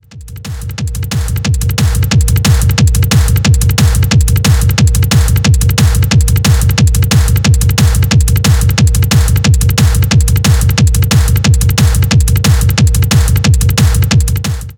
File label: Infinite Locked Groove